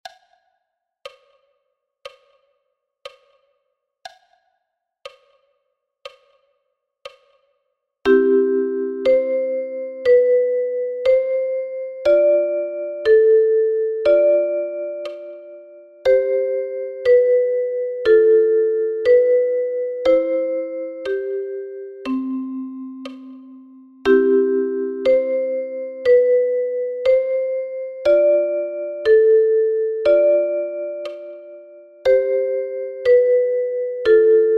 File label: Aura Lee